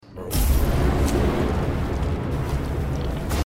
fire; risk of rain 2

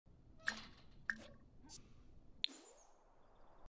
clicking; sims 4

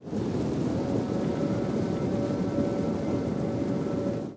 horn far; rome 2; rome total war serie

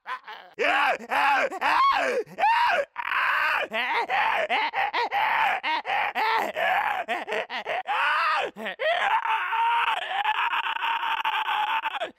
enemies stalker sound idle

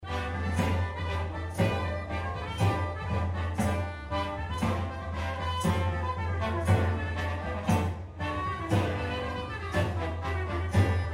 music tavern; baldursgate

